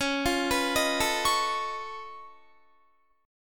Db Augmented 9th Db down Guitar; arpeggiated; 4E,4A,5D,5G,5C,6F

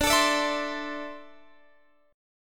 D 7th Suspended 2nd down Guitar Half Step Down

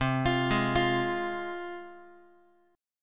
C Suspended 4th Sharp 5th, C, down, Guitar; Csus4#5 arpeggiated; x,8,8,6,9,x; JGuitar

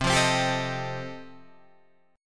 F 9th C, Guitar, Standard, F9/C Chord; F/C 9th

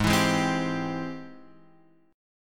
G sharp Augmented Major 7th G sharp, guitar; G#+M7 Chord {4 X 2 1 1 3} strummed

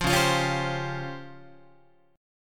C sharp Major 7th Flat 5th Guitar; C#M7b5 strummed; JGuitar

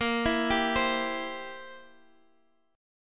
C Major, chord; arpeggiated; x,x,8,9,8,8; JGuitar

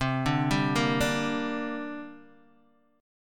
C 7th Suspended 2nd, Guitar, Open D

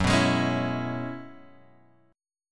F sharp 9th Suspended 4th F sharp, chord; F#9sus4 Chord {2 2 X 1 2 0} strummed

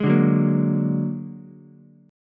Bb Augmented 7th Bb down Ukulele; Bb+7 strummed; JGuitar

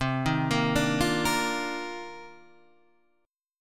C Minor 11th C down; arpeggiated; JGuitar

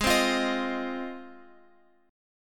Ab 6th Ab, Guitar, Open F; x,x,8,7,8,10; Ab6 strummed

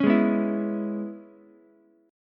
Db Minor 7th Db down Ukulele; strummed; JGuitar

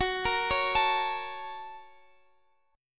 A sharp Minor Major 7th Sharp 5th

